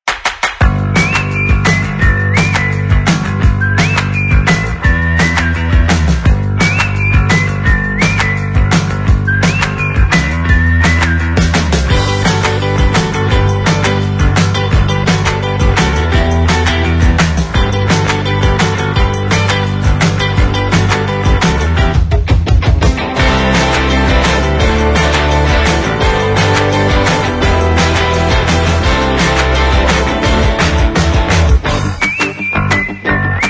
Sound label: Upbeat, 鼓舞人心的流行摇滚音乐轨道与手掌，口哨，吉他即兴重复和 farfisa; 音乐素材 鼓舞人心的乐观的独立流行音乐 Upbeat Uplifting Inspiring Indie Pop